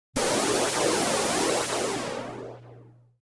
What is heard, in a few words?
Processed Air Release; Electronic Sci-fi